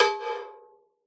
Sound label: Sfx Baseball